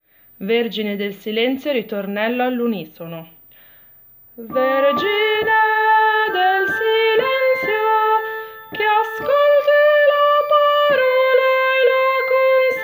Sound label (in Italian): ritornello all unisono